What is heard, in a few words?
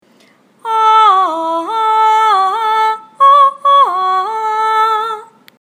Vocal melody; First sing the melody